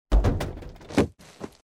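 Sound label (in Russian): ударился и упал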